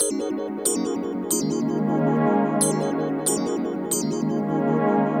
Hip Hop and R&B Drumloops

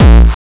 SUPER KICK, PHUZZ DRUMS; Spectrasonic Distorted Reality 1

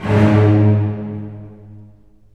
STR, Full Short; Roland - LA Composer vol. 4

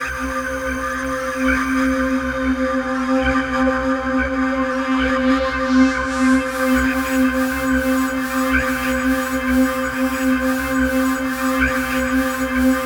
cobra pad C4; ambient atmospheres

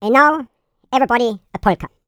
POLKA, YODEL YELL, World Voices; ILIO; Vocal Planet

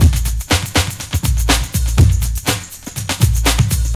Big Loop, Drums & Percussion, Electronic; Sonic Foundry (Sony Creative Software) - Electronic Point Blank

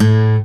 pop, Guitar; 90 s Sample CDs; Quantum Leap - Guitar & Bass

